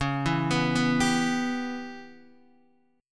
Db Minor 7th Flat 5th Db down Guitar DADGAD

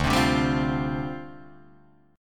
D Minor 11th D down Guitar Open D

